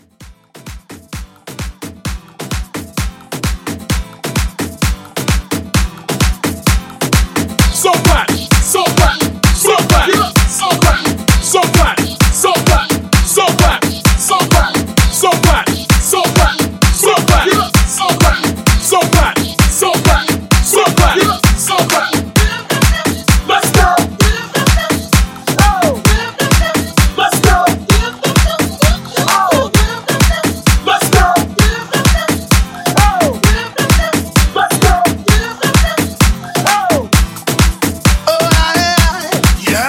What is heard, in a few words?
Hype Reboot, 130